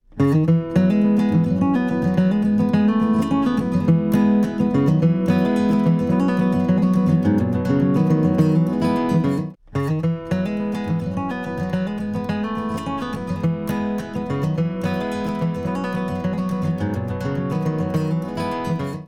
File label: guitar proximity bad good, Moving the mic back to reduce boominess; Mixing for God audio examples